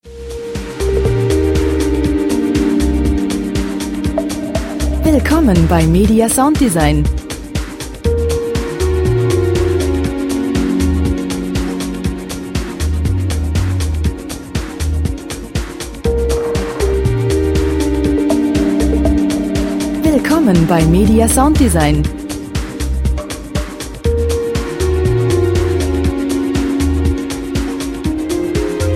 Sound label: lounge time to chill